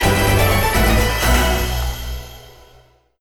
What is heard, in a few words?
Jingle; Weaponget